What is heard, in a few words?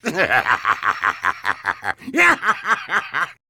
maniacal laugh; lechuck; mi2